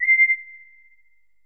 hi bias

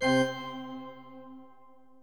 pipe organ

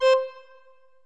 reed organ

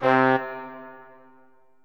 full horns

